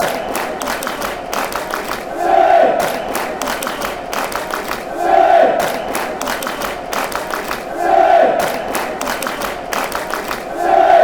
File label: city claps; ccfc